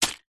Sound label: toy lego impact many pieces in box